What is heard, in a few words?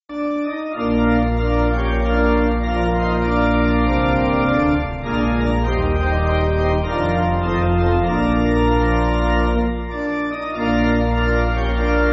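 Pipe Organ, Free Music Backing Files for Churches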